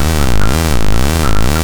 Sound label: FX LOOP 7, Big Beat Samples; Big Beat Samples & More; AKAI; FX LOOPS KIT